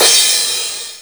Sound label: Cymbal One Shot; Drums